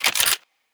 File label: Weapon Reload